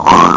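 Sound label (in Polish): chrap, snore; jurajski sen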